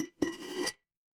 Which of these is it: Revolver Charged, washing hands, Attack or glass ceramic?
glass ceramic